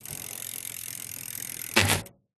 vehicles bicycle wheel spin air down tyre skid stop; zapsplat